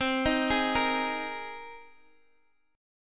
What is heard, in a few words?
C Minor 7th Sharp 5th C Guitar DADGAD